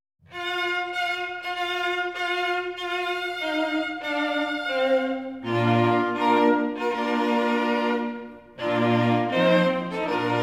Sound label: Here Comes The Bride (String Quartet)